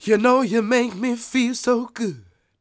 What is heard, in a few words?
SING W, Vocal Planet Blues & Soul, BLUESY; ILIO